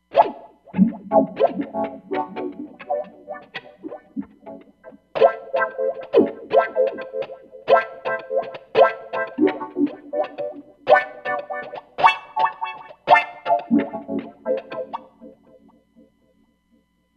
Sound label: Dubguitar; Roland Fantom XR